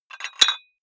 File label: dumbbell put on very small weight hit